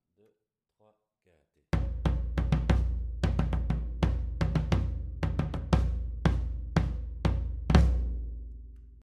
Coda Ternaire Bass, batucada

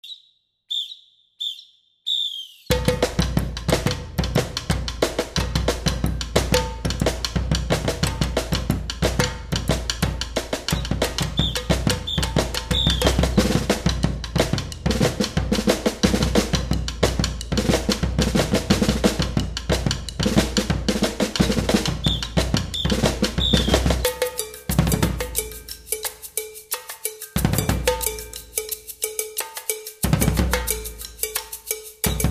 Tribal Style Morceau, batucada

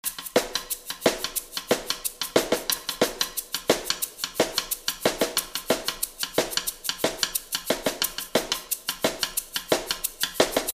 Tribal Style Snare, Batucada

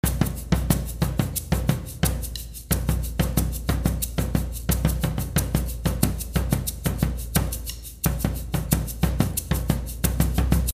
Tribal Style Bass, Batucada

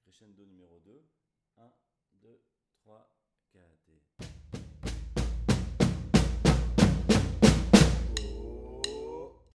Crescendo, batucada

